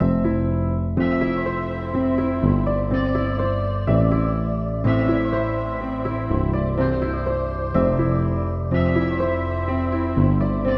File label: 124 BPM C Maj, Melody; Cymatics; 2022 Melody Collection Platinum Expansion